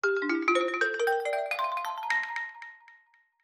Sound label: Diosma, ringtones, HTC One M8